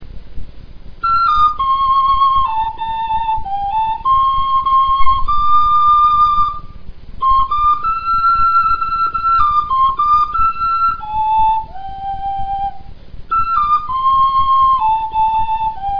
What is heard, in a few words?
glass